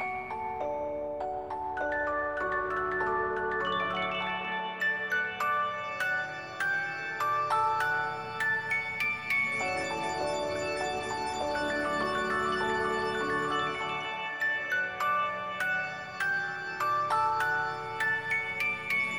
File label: Recorder; MiSide